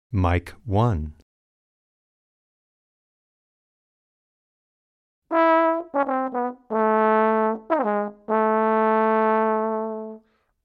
Trombon

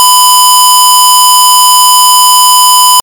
Sinus digital överstyrd

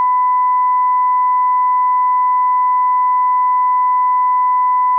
Sinus

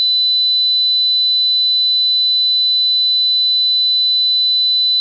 5% IM utan 1 kHz

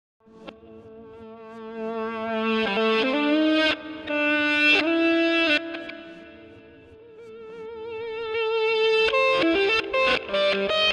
Gitarr med baklänges och med reverb

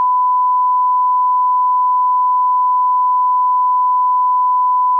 Sinus

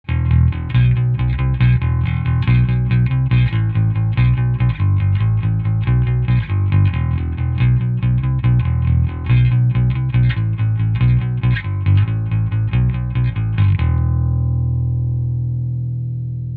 Bas U47 nära

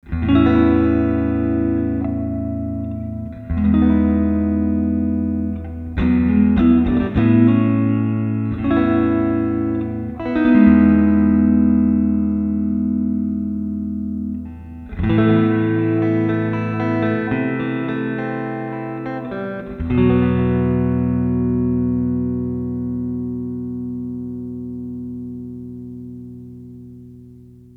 Gitarr genom tube stereo EMT plate reverb

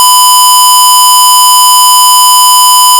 Sinus digital över

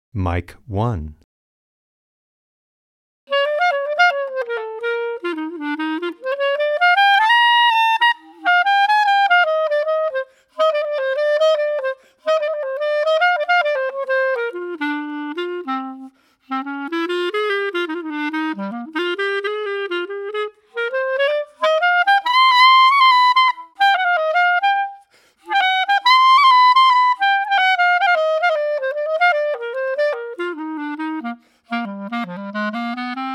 klarinett

